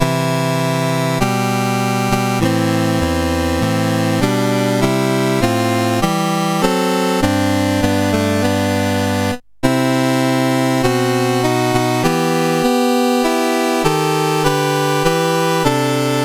menus, sid CSG8580R5; Games Summer Edition

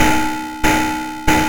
sid MOS6581R2; Express Raider

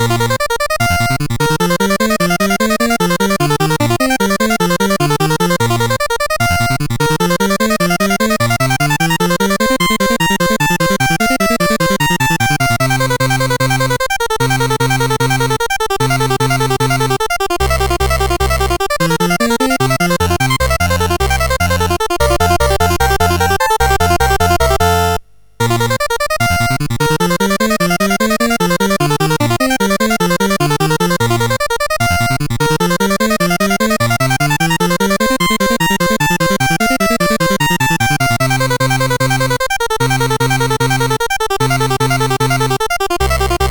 Sonata in B-Flat Major CSG8580R5

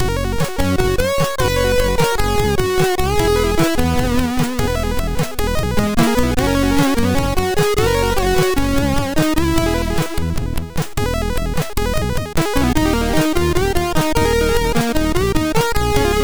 Eighties, MOS6581R4

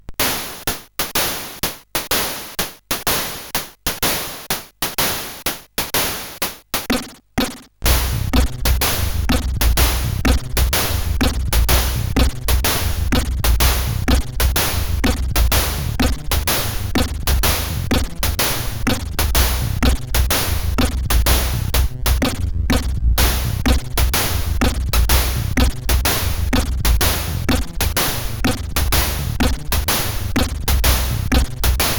Jazz sid CSG8580R5

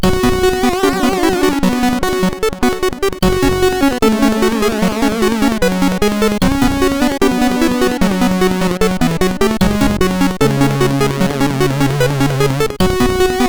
Atmos, MOS6581R2

